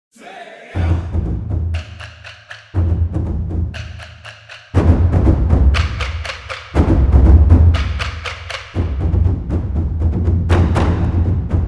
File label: taiko